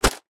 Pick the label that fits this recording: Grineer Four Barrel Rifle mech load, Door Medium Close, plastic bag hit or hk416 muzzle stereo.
plastic bag hit